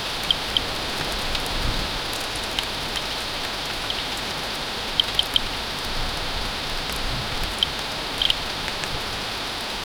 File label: heavy steady rain; dripping